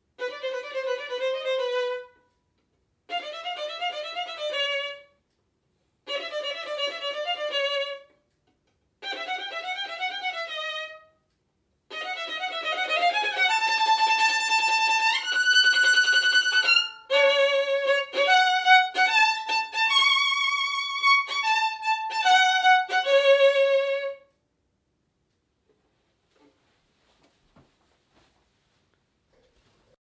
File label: Violin; Beethoven